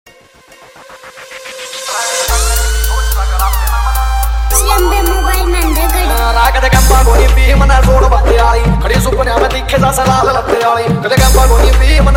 Dj Remix Hard Bass Vibration Mix

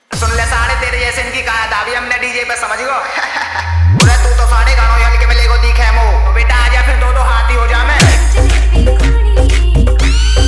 Full Edm Trance Remix Song

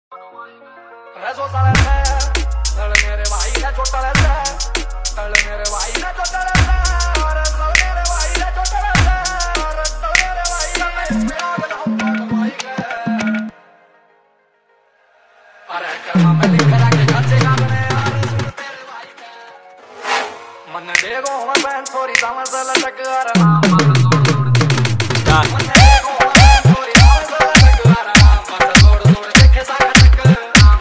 Remix Haryanvi Dj Song

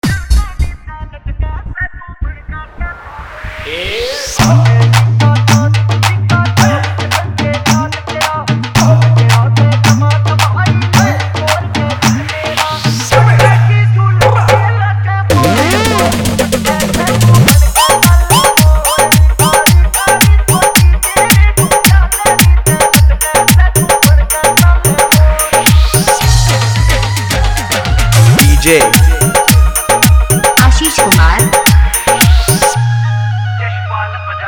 Dholki Remix Song

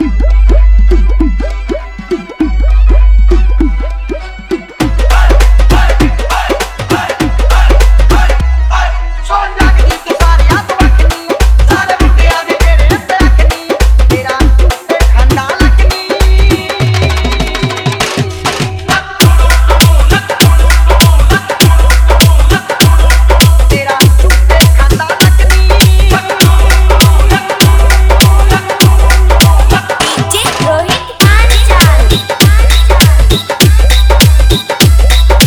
Punjabi Dhol Mix

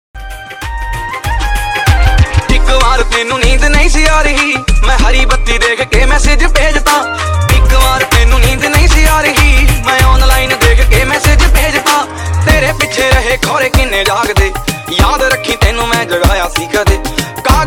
Dhol Remix

